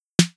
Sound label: snare